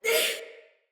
Pain, Female; Sinecure Soldier Female B

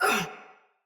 Pain Soldier Mace Agent Female; Battle; Sinecure Melee

